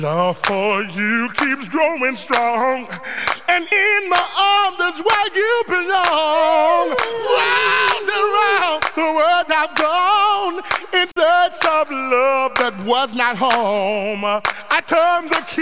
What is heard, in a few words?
Acapella